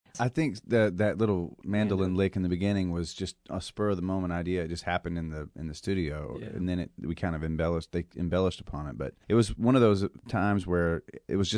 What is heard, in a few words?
mando lick